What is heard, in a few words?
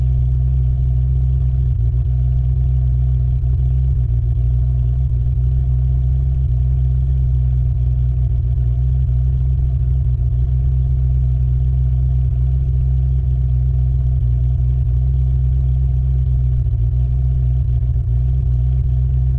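ferrari f40 lm onboard idle steady short exhaust mix; Sonniss GDC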